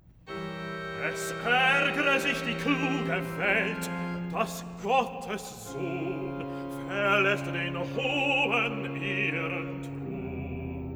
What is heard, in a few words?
Cantata, Recitativo (Bass); BWV 152 'Tritt auf die Glaubensbahn'; V. Recitativo (Bass) 'Es ärgre sich die kluge Wel; Bach; New York; Sun after Xmas